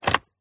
Printer Toner Cartridge Open Close Pull out Insert Eject; MKH8060